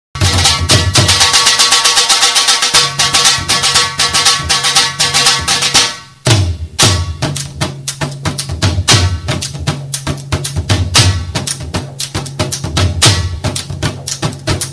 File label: DHOL